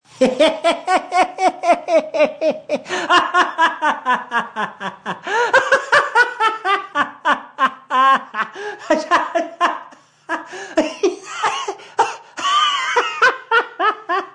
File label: Laugh Long, Male laugh, long, belly; Laughter Sound Effects